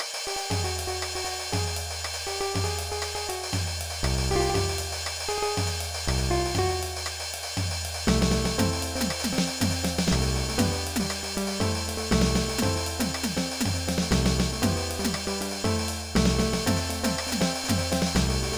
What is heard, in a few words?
drum galore house; Bars and Pipes